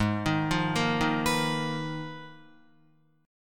Ab Minor 6th Add 9th, Ab, Guitar; arpeggiated; JGuitar

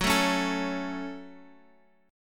Gb Minor, Guitar; Gbm strummed; 14,12,11,14,x,x; 4E,4A,5D,5G,5C,6F

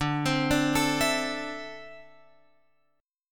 D 7th Suspended 2nd down Guitar Open F; D7sus2; arpeggiated

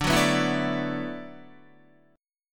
D 6th Add 9th D, Guitar; D6add9 strummed; JGuitar

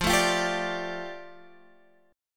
G 7th Suspended 2nd F

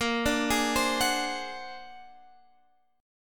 A sharp Augmented 9th, Guitar Standard; arpeggiated; 18,17,18,17,19,x; chord

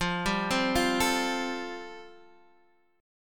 G 7th Suspended 2nd Suspended 4th F chord

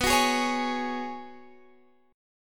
Gb Suspended 2nd Suspended 4th B

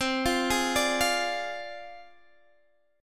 D Minor 7th Flat 5th C, guitar chord

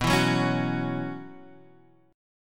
B Suspended 2nd Suspended 4th, Guitar, Standard; Bsus2sus4 strummed; JGuitar

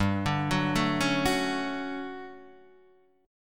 D-Major 7th G; DM7/G Chord {3 5 4 2 2 2} arpeggiated

